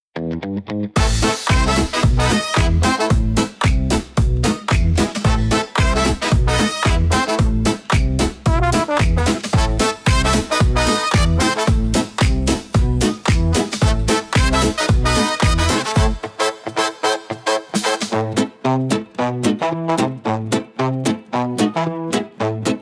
Jukebox Revolver, electro swing pop for trendy bouncing rhythm